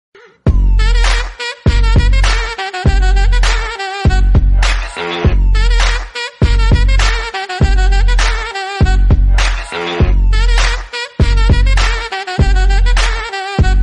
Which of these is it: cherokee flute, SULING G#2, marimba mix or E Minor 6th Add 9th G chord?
marimba mix